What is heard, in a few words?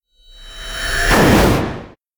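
caterpillar attack; monster; Senillinea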